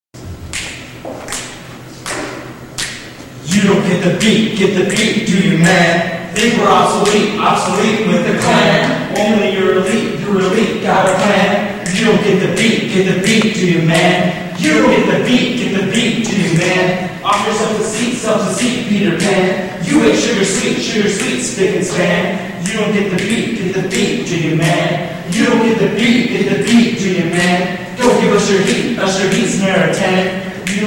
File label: beat